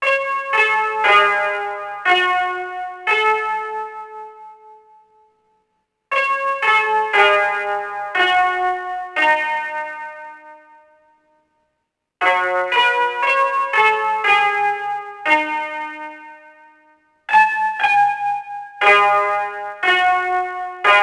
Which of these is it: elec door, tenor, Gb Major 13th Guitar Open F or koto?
koto